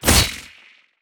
Scorpion Attack; ScorpionKing

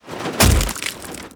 Mimic Attack

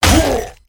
Hellion Attack

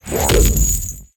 Rocko Attack

